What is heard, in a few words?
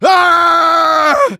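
engineer pain critical death; tf2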